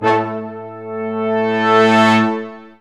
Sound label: BRS, Lrg Ensemble; Roland L-CD702